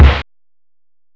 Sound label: SNARE, DRUMS